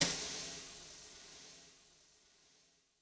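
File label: Brush Attack, Yamaha DTXpress-II snare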